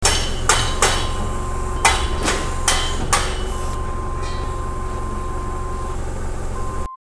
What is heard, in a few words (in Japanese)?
kensetu